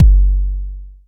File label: analog attacked, Kick; mpk mini presets; Ableton Foundation FreePacks